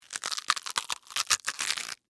Tear Rip Cabbage, Texture, Tear, Rip, Cabbage, Head, Rubbery, Vegetable; Ripping Sound Effects; BlastWave